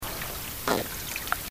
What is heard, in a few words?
Hoplerythrinus unitaeniatus; Amazon Fishes